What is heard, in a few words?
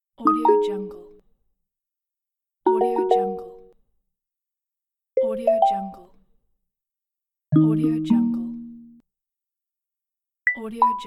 nice new message notifications; efxideas